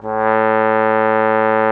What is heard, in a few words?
TROMBONE; A2; Xsample VOL-3